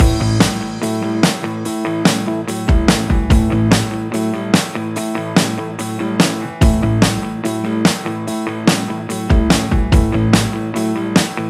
Upbeat Country Blues Loop

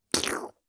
Splatter

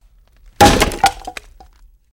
Axe Wood

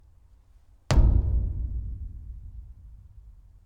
Bump Against Metal Plate

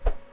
Swoop

